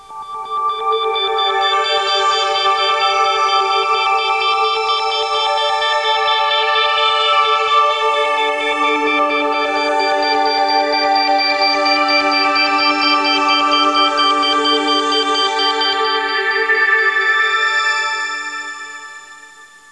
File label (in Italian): musica newage